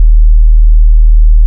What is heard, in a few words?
sin signal 39Hz